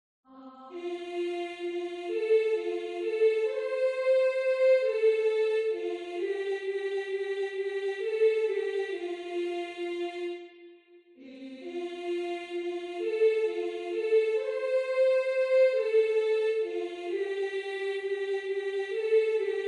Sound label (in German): solo Ich geh´ mit meiner Laterne